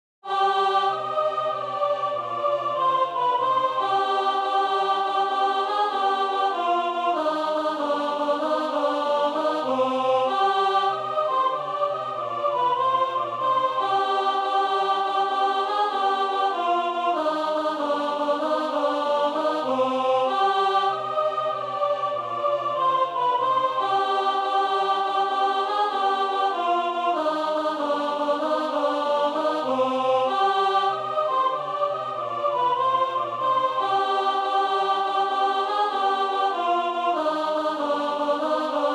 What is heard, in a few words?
Sop, Swing; Bourree